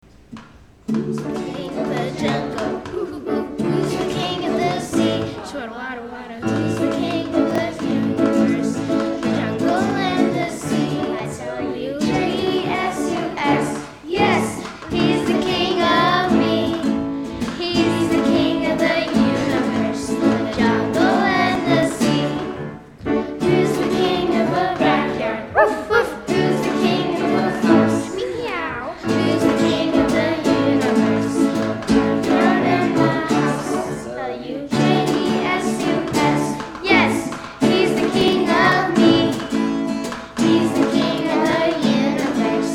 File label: Kids Praise; Summer Childrens Church Kids